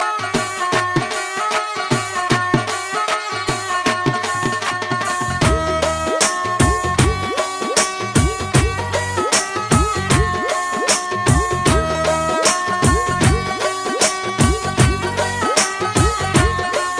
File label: Cute Beats